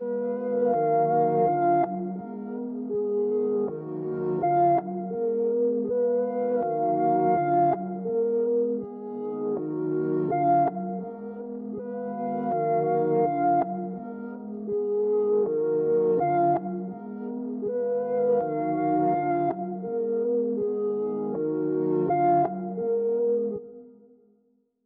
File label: 163 BPM D# Min Reverse Keys; Melody; Loop Stems; Cymatics; 2022 Melody Collection Platinum Expansion; Homebound